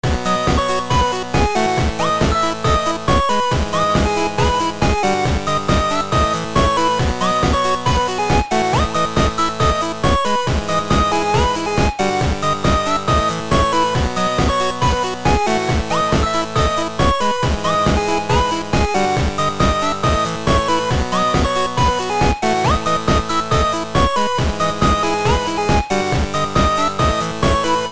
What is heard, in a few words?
8 bit; Death Parade